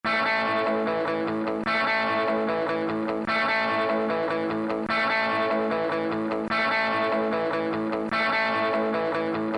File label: guitar loop